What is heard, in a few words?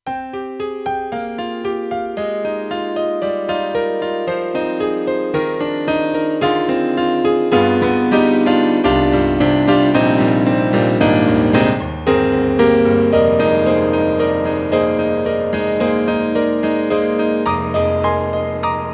Yamaha TG300 GM-B Grand Pno